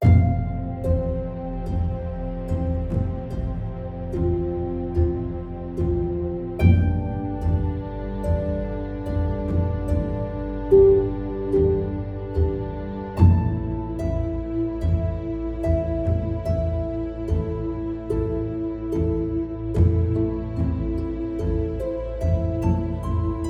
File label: Elven Harmonies; Heavenworld